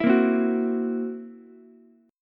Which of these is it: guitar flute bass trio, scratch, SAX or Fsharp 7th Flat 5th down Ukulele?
Fsharp 7th Flat 5th down Ukulele